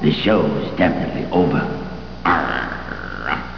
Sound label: wolfman